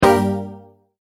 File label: multimedia tone exclamation; McKinney Sound